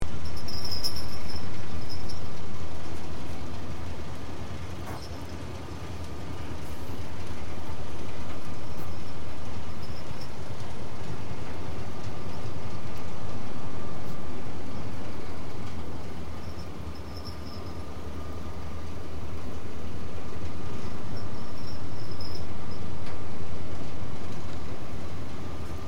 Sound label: Dolphins